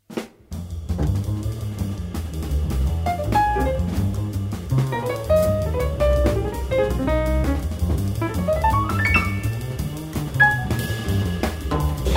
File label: Morning blues